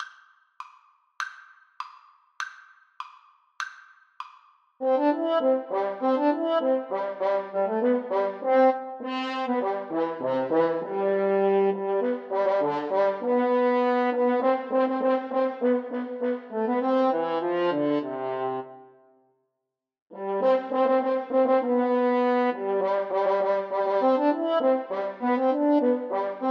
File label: grand old duke HN DUET, French Horn Duet